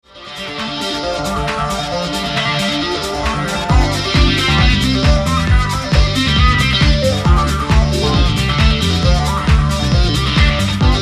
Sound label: jig